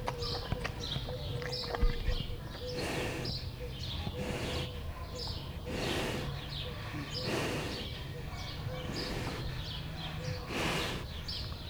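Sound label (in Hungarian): szuszogás, csámcsogás, orvosmedve; debreceni zoo 2012; marantz puska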